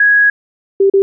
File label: short double low; UI Sounds